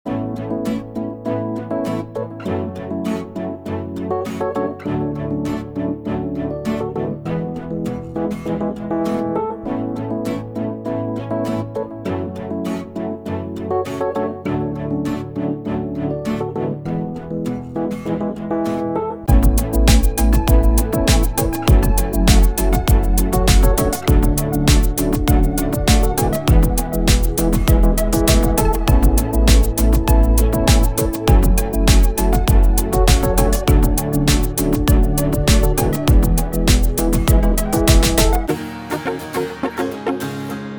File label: pop